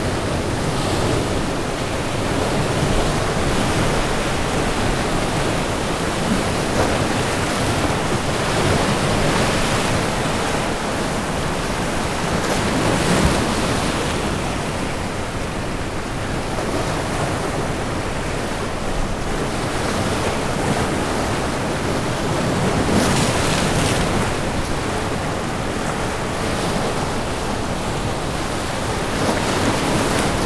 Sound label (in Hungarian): közelebb a kikötőhöz, ocean; orzola